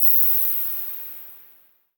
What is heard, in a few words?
Downsweeps